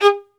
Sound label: Synclavier Strings, SOLO VI; ILIO